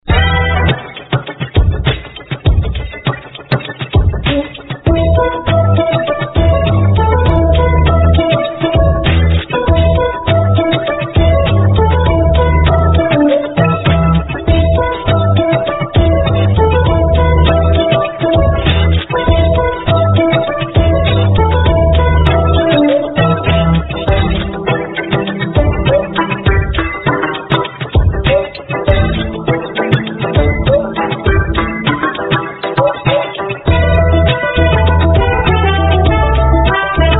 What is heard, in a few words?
Strange Happy - Gentle; macOS